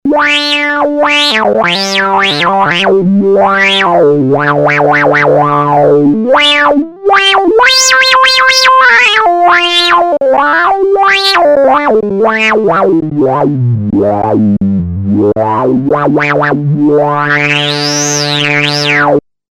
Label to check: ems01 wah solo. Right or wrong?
Right